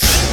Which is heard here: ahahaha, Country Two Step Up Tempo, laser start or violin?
laser start